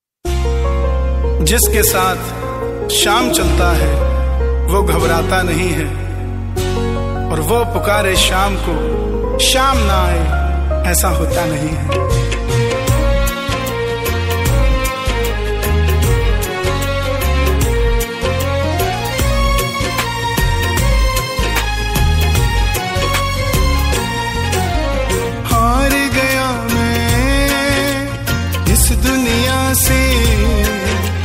Trending Bhajan

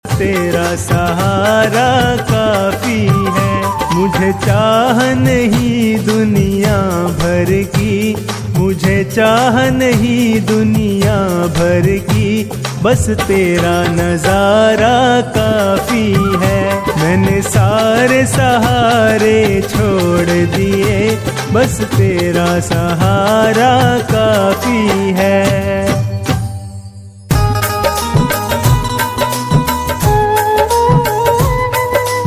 Khatu Shyam Bhajan

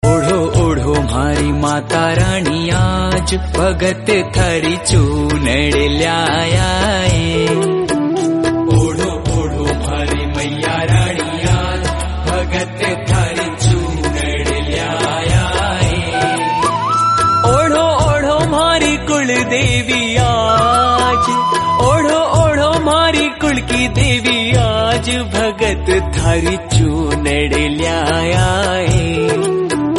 Bhajan Rajasthani Song Ringtone; Jeen Mata

